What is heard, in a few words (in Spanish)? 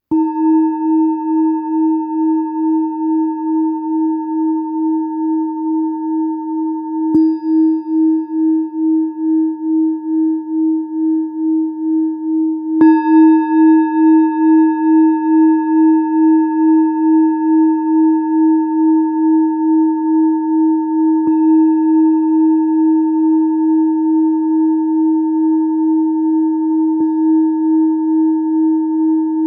Deluxe re 318, cuencos tibetanos